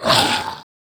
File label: zombie pain